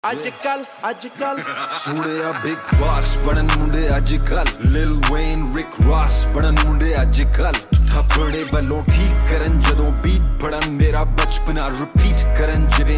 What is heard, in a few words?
Rap ringtone, hindi rap ringtone